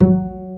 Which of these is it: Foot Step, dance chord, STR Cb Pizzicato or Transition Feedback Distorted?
STR Cb Pizzicato